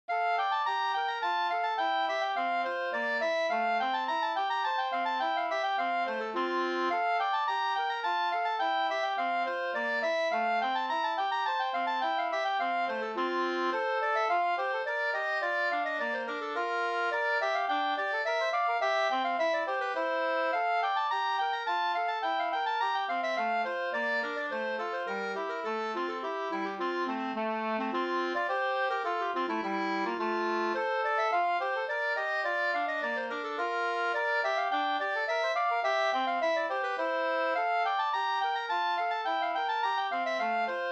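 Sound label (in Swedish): slangpolska, two-part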